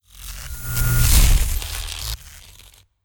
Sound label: Elec Burst; Legend of Keepers; Aerobot